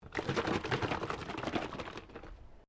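Carton orange juice cardboard shake, Milk or juice container; Food Sound Effects; Food (Sound Effects:Household)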